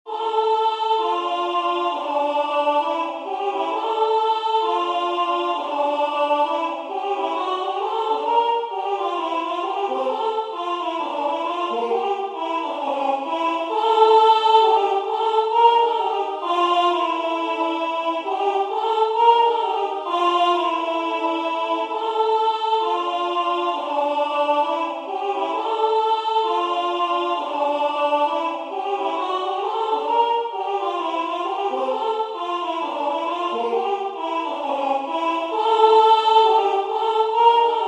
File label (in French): Canon des scats voix